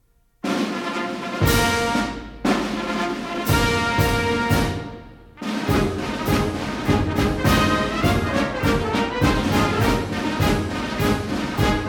two ruffles and flourishes into flag officers march; Marine Corps Birthday Ball